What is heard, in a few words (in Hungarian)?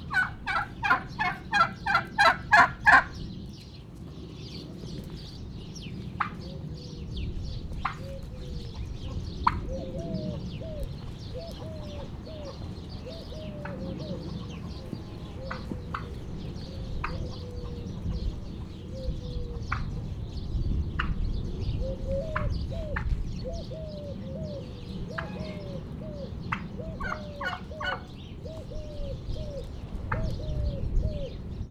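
pittyegés szeles idő, bronzpulyka; Hortobágyi Nemzeti Park 2013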